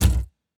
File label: Ui Select Stereo